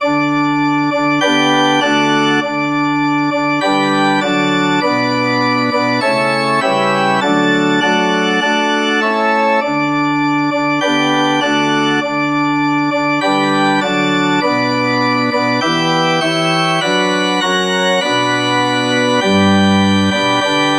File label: come ye lofty, organ